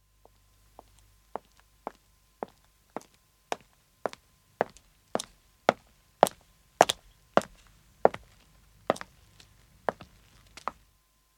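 Footstep pavement walk, 1 man approaches and stops; BBC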